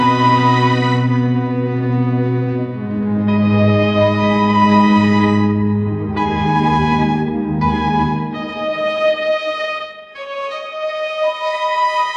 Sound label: elegant cinematic strings and piano, ringtones